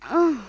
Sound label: damage thief female